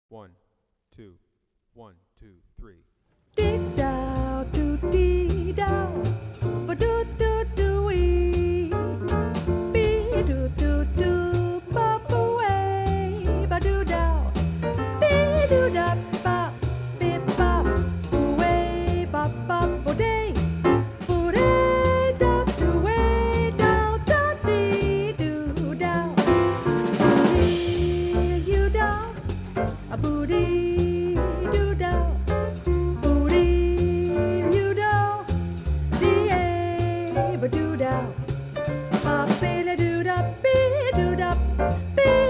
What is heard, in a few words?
Saxophone; Jazz Conception For Saxophone; 21 Solo Etudes (Eb Saxo)